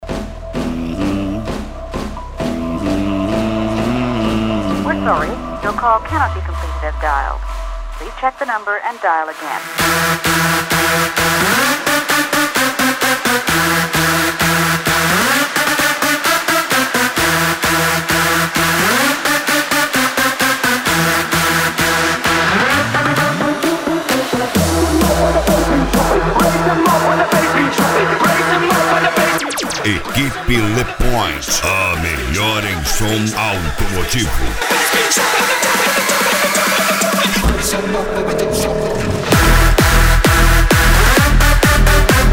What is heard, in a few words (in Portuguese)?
deep house e pisadinha; le pointe som e acessorios; funk